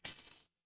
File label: glass shatter